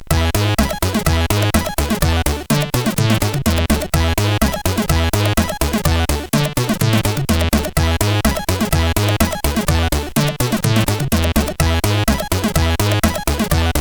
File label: Intro Ska, CSG 8580